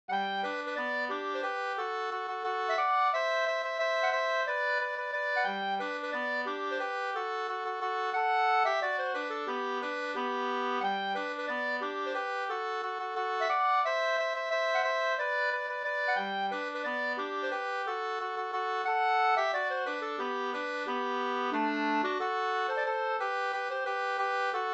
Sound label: polka, polkett